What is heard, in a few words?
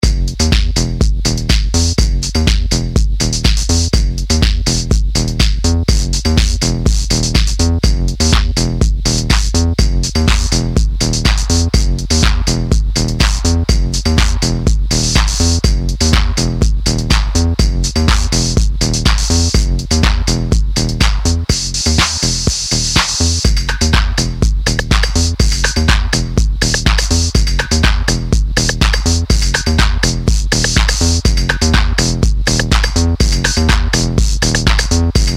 instrumental